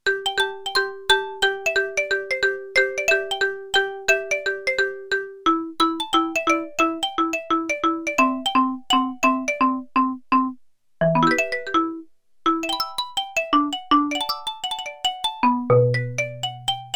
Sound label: Xylophone